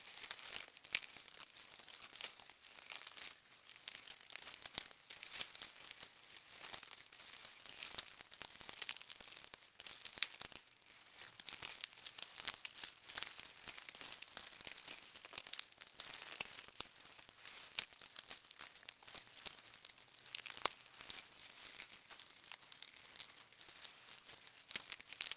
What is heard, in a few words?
rustle studio performed broadleaf reeds manipulation loop; fvtt sonniss gdc audio